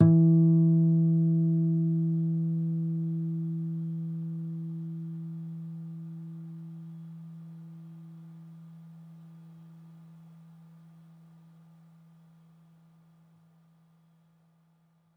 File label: Harm, Classical Guitar